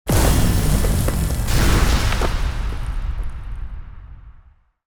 skill burst

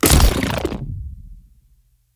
grenade

